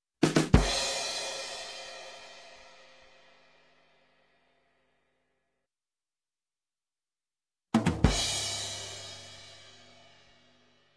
Drums accent, Music, Percussion; Sound Ideas 2000 Series

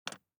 DICE on Glass, Grab, Standard, 3 Three Dice; Samples; ArticulatedSounds; DICE Sfx Party (Extended)

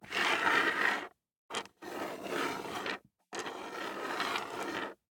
DICE on Hard Wood, Slide, Standard, 4 Four Dice; Samples; ArticulatedSounds; DICE Sfx Party (Extended)

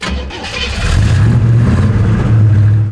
Truck 4x4 Start; Trucks

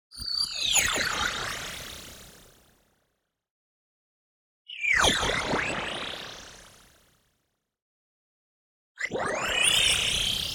movement long, tone bend frequency; boom library; Anime Essentials; DSGNMisc

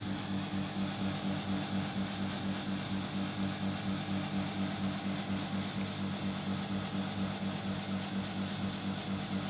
air condition outside unit; devices accessories utilities; NS Studios - ultra sound collection

